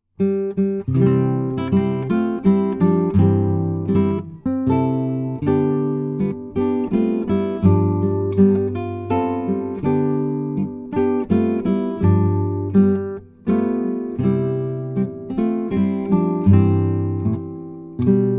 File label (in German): Ein bisschen Gitarre